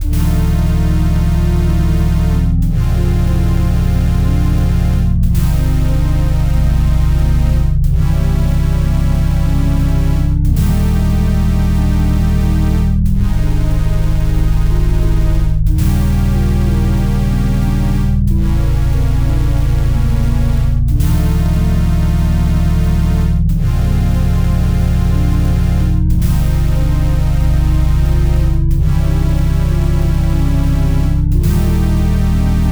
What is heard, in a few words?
dungeon adventure